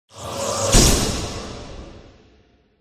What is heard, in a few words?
angel